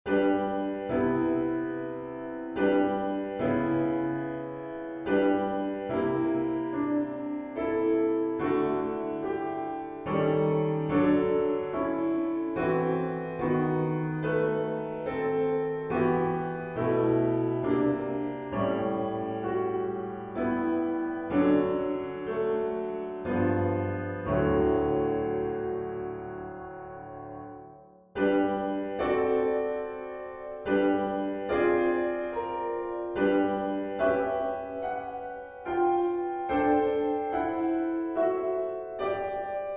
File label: piano; Orch Type Ib